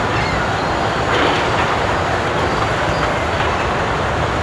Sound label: ambi door; Mafie 1